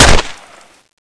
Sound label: player stab head